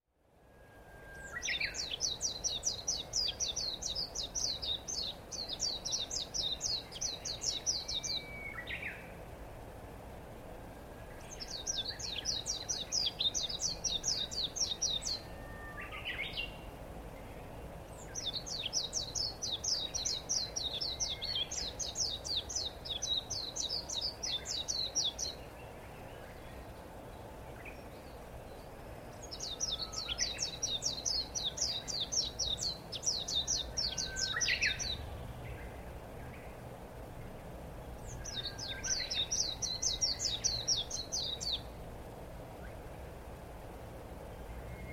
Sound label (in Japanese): mejiro, bird